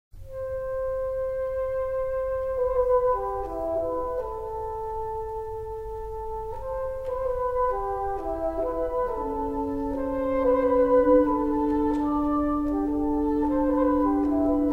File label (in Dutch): emoties droevig